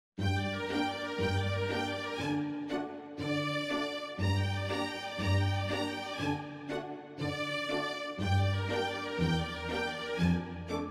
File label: Clog Dance, strings